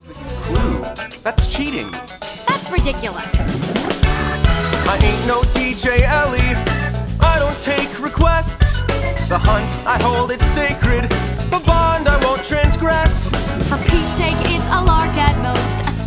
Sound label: Vocals